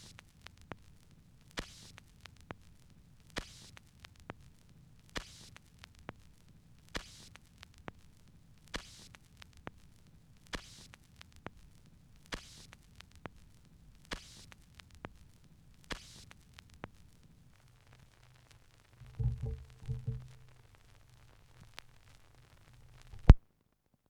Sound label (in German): schallplattengeraeusche